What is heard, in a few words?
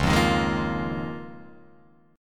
Db 6th Add 9th Db down Guitar Open F; Db6add9 strummed; JGuitar